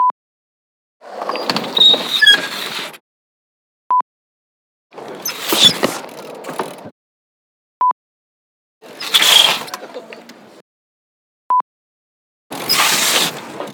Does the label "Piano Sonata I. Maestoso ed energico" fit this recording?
No